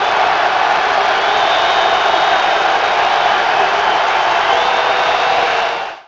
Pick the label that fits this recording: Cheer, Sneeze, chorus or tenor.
Cheer